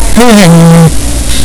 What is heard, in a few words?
hehehe; midnight guns; invisible terrorist